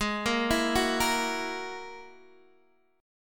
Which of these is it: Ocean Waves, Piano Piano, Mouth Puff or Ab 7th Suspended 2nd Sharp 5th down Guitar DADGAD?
Ab 7th Suspended 2nd Sharp 5th down Guitar DADGAD